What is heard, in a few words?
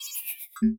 technology down; OnePlus OxygenOS 13